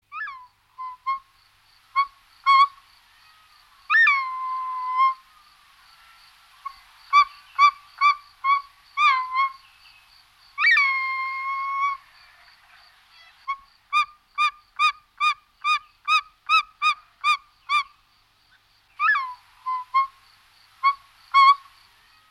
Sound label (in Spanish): Aguila culebrera